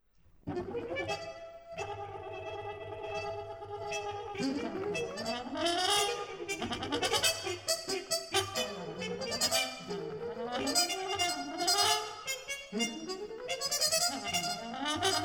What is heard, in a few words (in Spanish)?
metal sobre metal; martin matalon